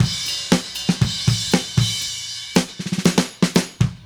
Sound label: Drums Fill, 70s Analog Funk; Sonic Foundry (Sony Creative Software); What it Is!; Good Times